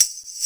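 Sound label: TAMBOURINE, DRUMS; CMP - Analogon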